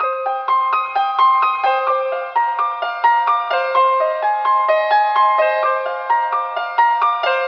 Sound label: piano dreadful, Loops Sad